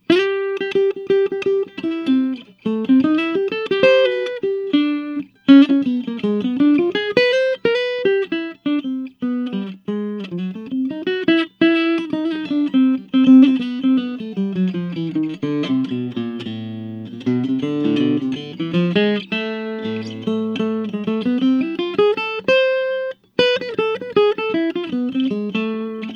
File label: Marchione 15 archtop European flame maple